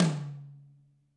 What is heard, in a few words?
GRETSCH TOM; ILIO; Double Platinum Drums 1